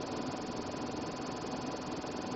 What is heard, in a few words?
Robot Hover; Pgj Breach Sfx